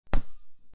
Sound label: ball bounce; punt